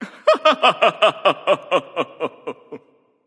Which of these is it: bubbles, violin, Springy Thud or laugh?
laugh